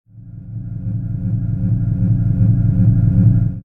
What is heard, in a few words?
machine generator fadein